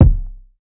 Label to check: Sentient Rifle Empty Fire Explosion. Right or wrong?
Wrong